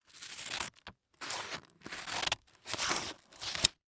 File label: Industry Tool Scissors Cut Paper